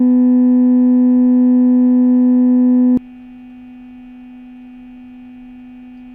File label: structure borne air borne sound 250hz